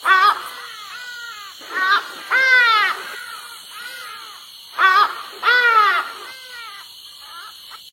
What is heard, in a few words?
ringtone